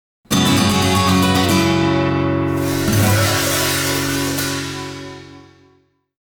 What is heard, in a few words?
Surprise; Tenchu 3 Wrath of Heaven